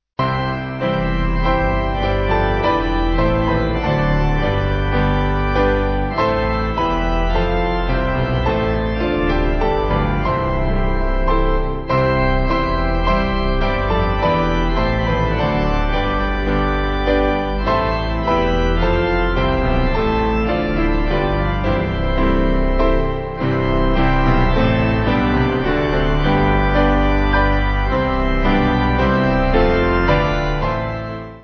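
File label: Piano Organ, backing; A Mighty Fortress; A mighty fortress is our God, a bulwark never failing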